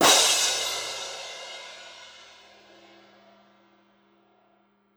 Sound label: Cymbal, Orchestral; Sonic Foundry (Sony Creative Software); Orchestral VOL-2; Modern 11